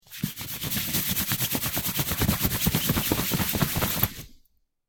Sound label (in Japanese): 激しい布擦れ